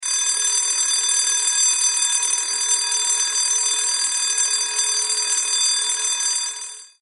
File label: Alarm Clock Sounds